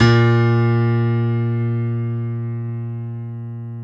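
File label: dance piano A#0; Best Service Advanced Media Trax Modern Composer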